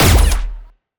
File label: GUN SHOTGUN, SCI-FI; Foundation Series